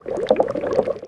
Pour Bitchumin

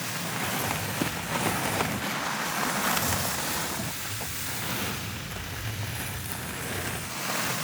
wood slide loop

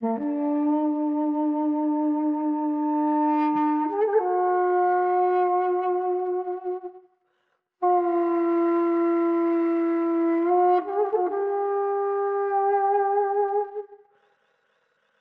Duduk; raji an ancient epic

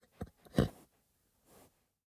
horse grunt

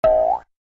Jump

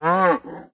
Cow Idle

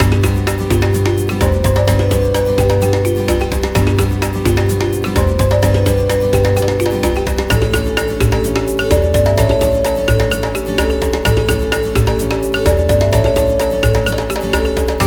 festival - long loop; peg solitaire; wii u

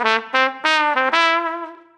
Trumpet Riff; Levels Hub Swamp; Music Ray

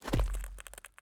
ice land; teardown; ps5 windows xbox series xs

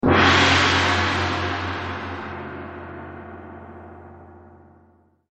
Gong

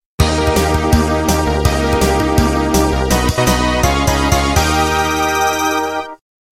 Stage clear; Rockman The Puzzle Battle

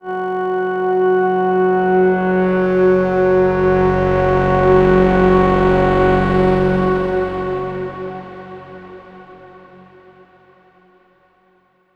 Overlay Drone; Act1 Anchor; Mirror's Edge Catalyst

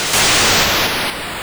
Missile Command Minimize; Atari 80 Classic Games in One

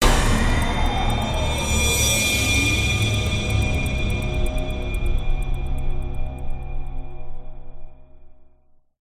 Medium Music New Ending; Alien Breed Trilogy; PS3; Xbox 360

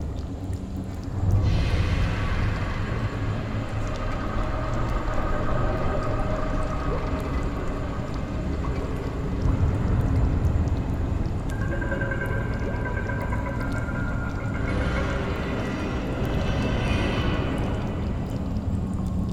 Factory Ambience

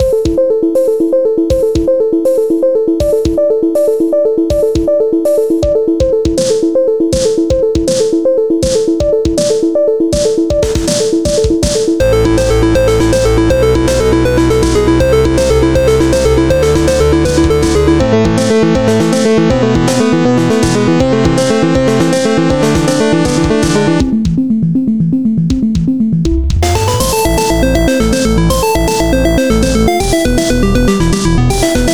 Castle 240 bpm; super stone legacy

